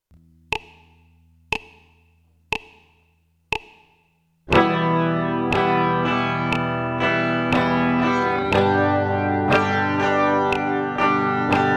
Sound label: strumming outro, guitar